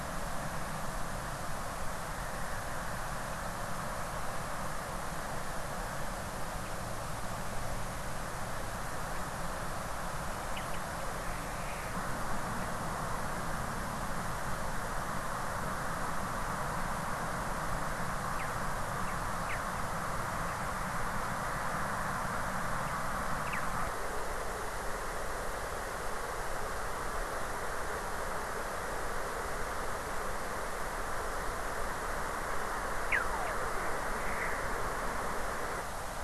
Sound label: WFR audio emfisis